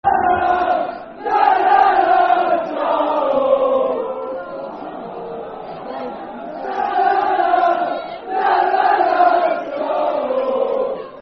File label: Football Chant (Soccer Songs), Tune; Na, Na Darlo; Darlington Dfc